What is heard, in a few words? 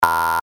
alarm